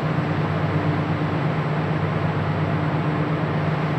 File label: InfiniVerb Noise, sparse soundscape Loops